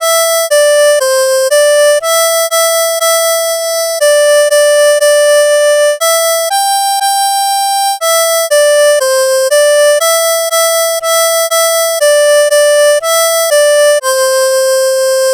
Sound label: Mary Had A Little Lamb low C